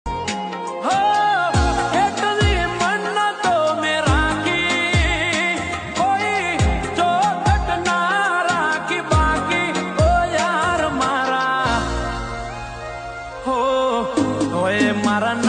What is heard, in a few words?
Gujarati ringtone